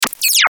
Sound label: Glitch Frequency Squeak; glitches; Blastwave